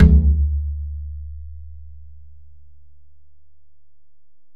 Ac Perc, Drum Machines Alesis DM-PRO

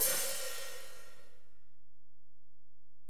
HiHat, Alesis DM-PRO

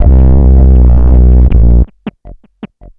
Bass, Futurist Drum & Bass

